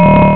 Warn; Battle Zone; MacBzone 1.1

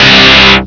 Electro Fuzz; Hyper Stacks; Pacific HiTech; MandelMusic

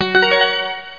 Level End; Chrysanthemum 1.01

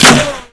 wpn impwood, weapons, impact; Spearhead Demo; MacFormat 2003 June

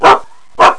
BARK

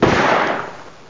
shotgun; alienbreed3d2

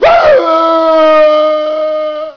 Howl; Fester; AddamsFamily

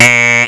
Buzzer; UltraDice

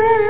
die; TurboInv

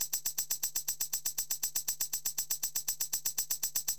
RYTH

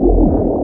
bomber

